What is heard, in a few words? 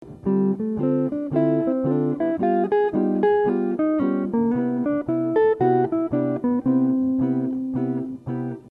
major 2 5 1